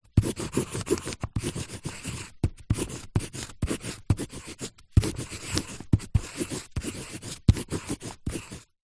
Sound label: office pencil artists thick writing on paper